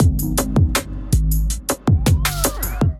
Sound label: Kit Melodic 160 bpm, Drums; MIDI Clips; Fear Pressure Kit; Ableton; Factory Packs; Skitter and Step